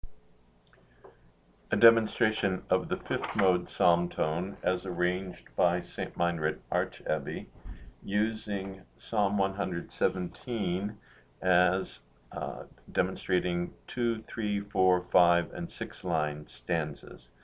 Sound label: psalm tone v